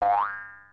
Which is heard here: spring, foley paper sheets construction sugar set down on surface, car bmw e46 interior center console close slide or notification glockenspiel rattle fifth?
spring